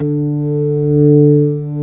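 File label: ORG B3 D 3, organ; Best Service Gigapack 2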